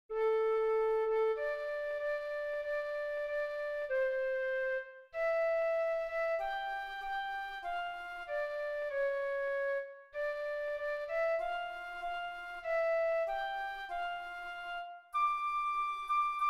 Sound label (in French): FLUTE; ANAMNESE St Jean